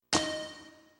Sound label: bounce